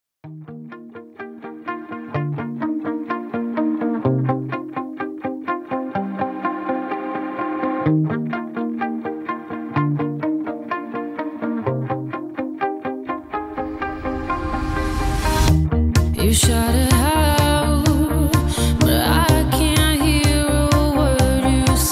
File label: MUSIC DUO RHYTHMICAL DANCE